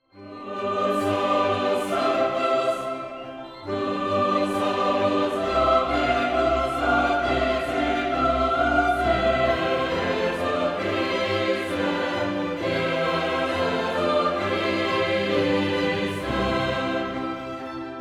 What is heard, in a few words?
Gloria Qu Boot stomps